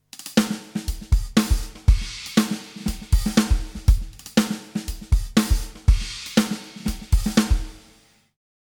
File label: studio drums small reverb